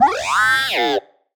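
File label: Sci-Fi Droid Robot Emote Neg; JASEM: Just Another Shooter with Electronic Music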